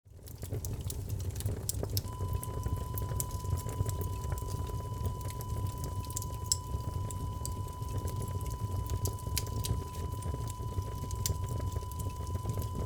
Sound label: fireplace